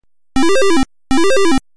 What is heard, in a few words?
jump; jumpman